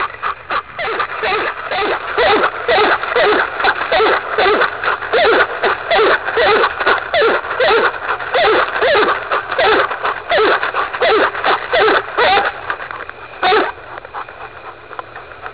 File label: bark